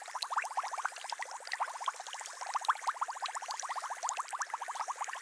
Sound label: water chemestry set